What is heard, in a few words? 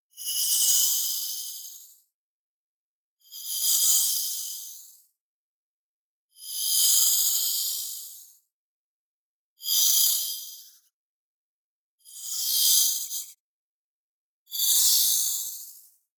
shimmer, coins freq shift delay; boom library; Anime Essentials; DSGNMisc